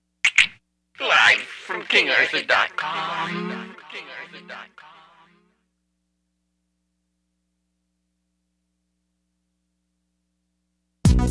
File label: Emerge Instrumental